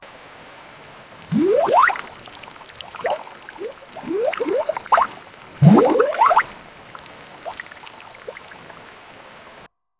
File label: water